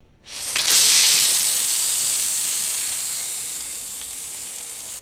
sharp wet sizzle and steam release; fire

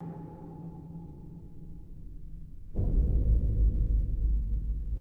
sharp metallic strike and pulsing low drone; designed

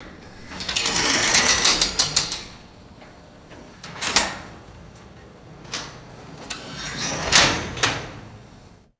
old metallic elevator gates opening and closing; doors

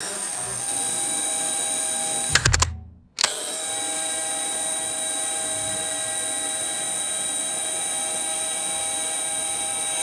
mechanical robot arm buzzy idling

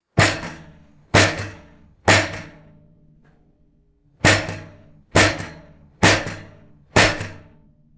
striking an aluminum screen door; doors

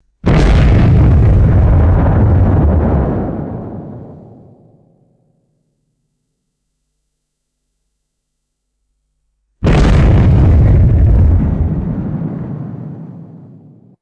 two deep explosive sonic booms; explosions

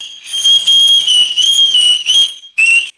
small steam whistle toots

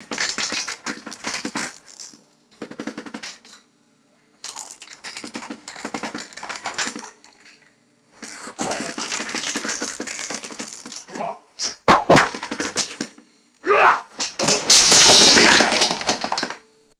hollow pvc pipes crashing and scattering

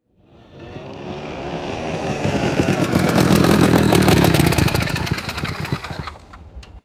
go kart approaches and shuts off; vehicles

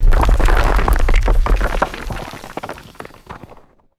small rock and dirt slide

